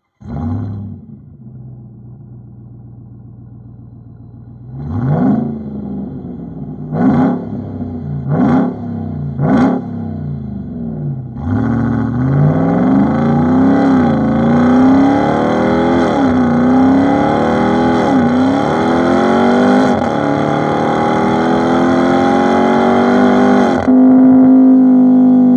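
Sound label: Dodge RAM, Active Sound System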